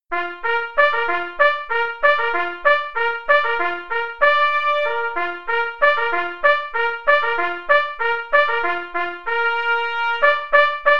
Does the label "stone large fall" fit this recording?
No